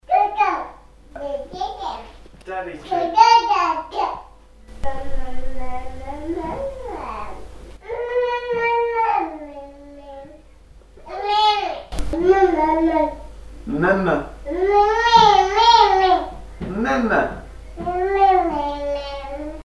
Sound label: baby voice